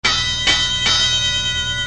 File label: Fire Alarm